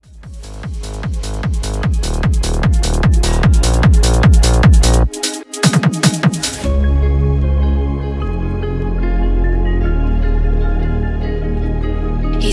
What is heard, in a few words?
Future House Rework; Remixfreakz